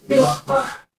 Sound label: feedback achievement